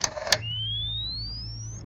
connecting hand radio to, handheld

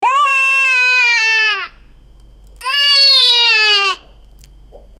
puppy crying sound

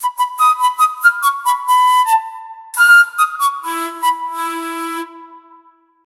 reverb flute that has a, shaker

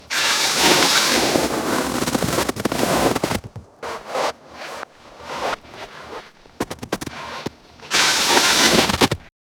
epic high speed cinematic

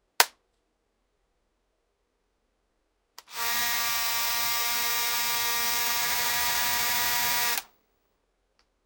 pulling the cable on the, fitness

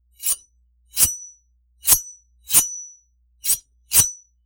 the sound of a sharp, blade

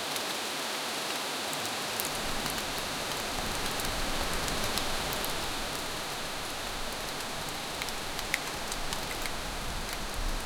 dense forest ambiance

